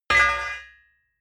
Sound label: hammer bounce ring